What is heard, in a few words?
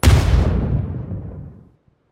explode